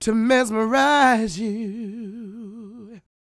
croon, Vocal Planet Blues Soul; Spectrasonics

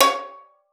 C#5, VIOLIN PIZZ; Xsample VOL-5; 90 s Sample CDs

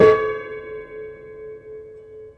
Prepared Piano; Battery 3 Sample Kits

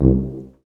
TUBA C, stacc; Roland L-CDX-03 Disk 2; BRS Tuba

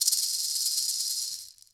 MARAC SHAKE; Best Service Advanced Media Trax Modern Composer; LATIN PC

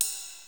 Ride Cymbal, Yamaha DD-7

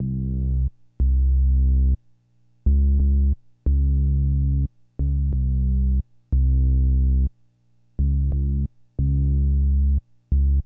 BSE, Hip Hop and R&B; Best Service ProSamples vol.02; AKAI

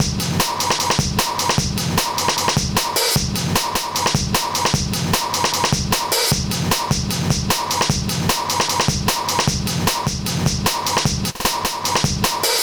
Drum Loops Compressed; Zero-G Smoke

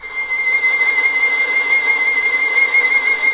violin, Solo Instruments; Miroslav Vitous - Solo Instruments VOL-2

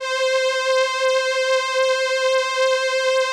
VP-330, String Machines

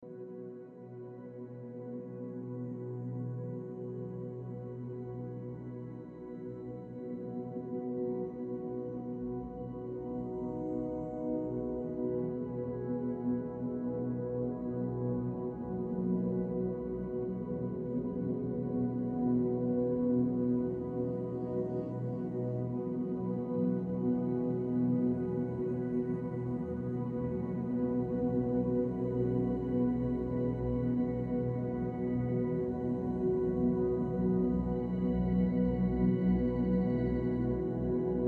Pad Convolver Experiment

